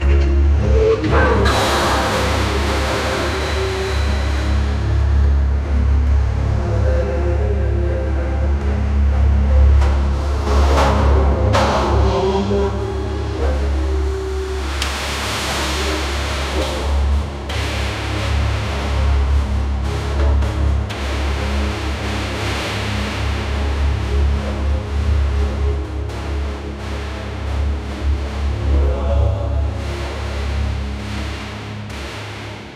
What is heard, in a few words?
combat sounds outside